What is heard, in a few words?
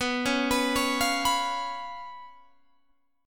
B Suspended 2nd Flat 5th, Guitar, Open G